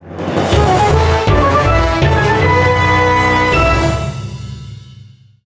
Jingle - Quest Clear

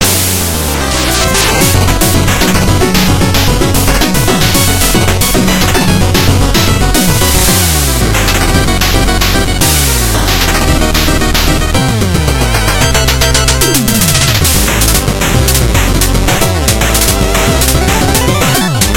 Music Boss; Boss Mole; Shovel Knight King of Cards

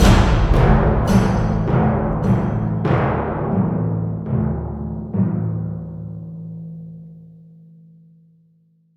Caves Death; Jotun

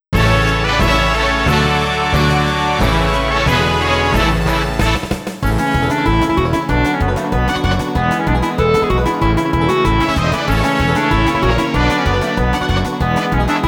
High School Anthem (Instrumental); Tamahachi High School

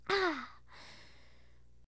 Eat; Kitchen; Hilzartov; Fairy Size Doesn't Matter

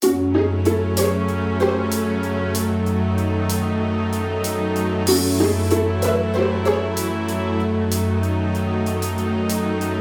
Jingle Serious; Taiko no Tatsujin V Version; PS Vita

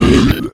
Monster Hurt

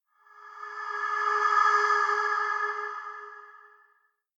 FLUTE; adams venture origins; EP MUSIC FLUTE EMPTY

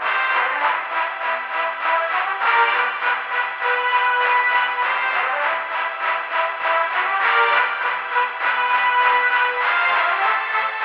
find opponent search music loop

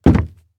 Jump Wood Land

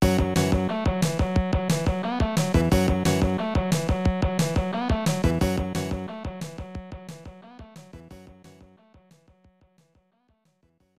Throne Hall Tension; Stone Protectors; snes

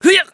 VO Death; VIP; Aragami

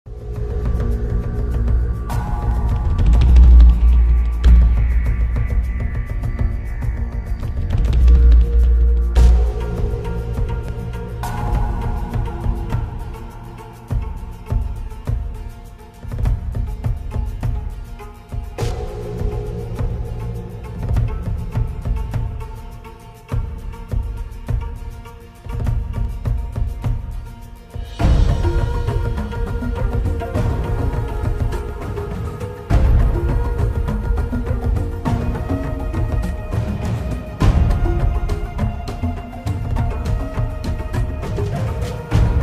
Swamp Battle Music; ARK Survival Evolved

